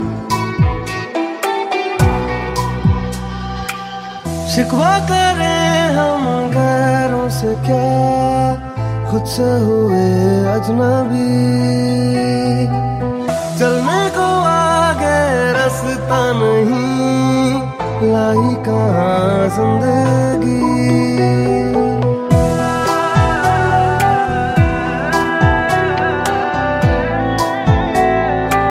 sweet bollywood song ringtone

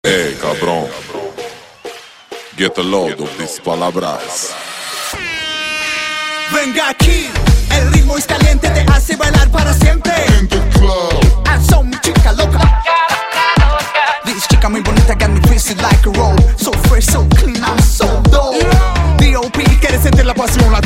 passion latina cool ringtone

